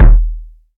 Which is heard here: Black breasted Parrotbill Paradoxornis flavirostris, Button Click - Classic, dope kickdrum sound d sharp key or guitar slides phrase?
dope kickdrum sound d sharp key